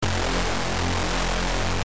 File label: Hoover